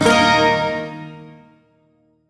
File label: Shawzin Chromatic Chord; G; Warframe; Duviri